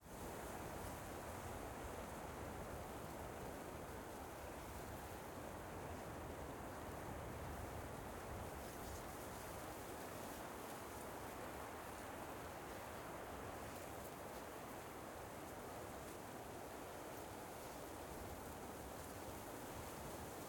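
Wind, Exterior, Breeze, Grass, Tall, Rustle, Buffeting; Hzandbits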